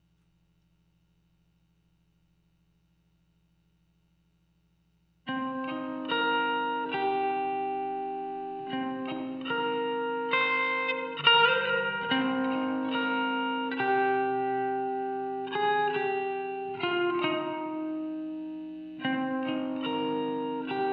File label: gtr texture